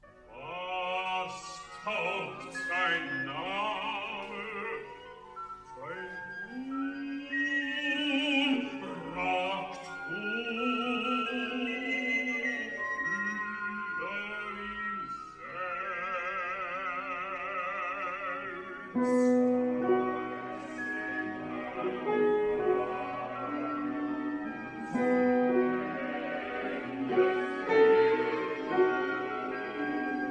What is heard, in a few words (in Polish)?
soprany; cardillac